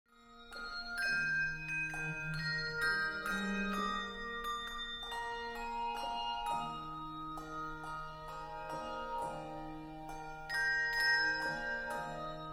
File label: saturday night waltz; folk